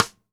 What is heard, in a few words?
Ludwig Supraphonic Snare Cross Stick; 1965 Ludwig Supraphonic Snare One-Shots; Sonic Foundry (Sony Creative Software) - Vital Drums